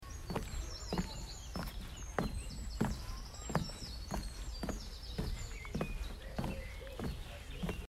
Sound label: Walk on the Wood Bridge